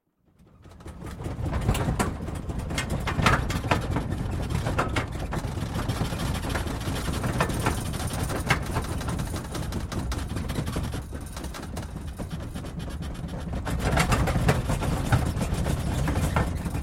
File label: airplane turbulance